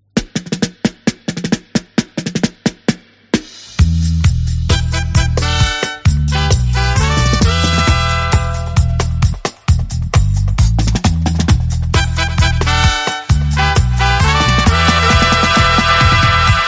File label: Raw Dub, Dub Reggae